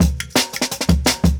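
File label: Jungle Breaks, 170 bpm; Donkey; Original Jungle Breaks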